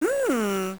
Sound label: hmm